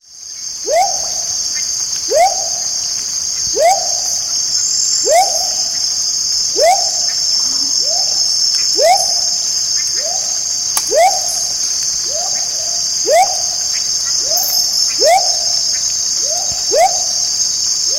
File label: Smoky Jungle Frog